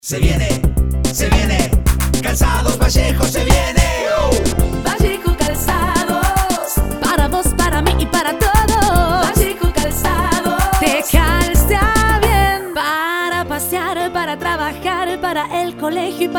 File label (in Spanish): Jingle; Vallejo Calzados